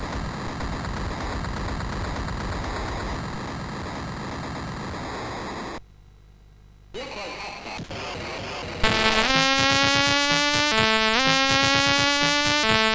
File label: Moving In, CSG8580R5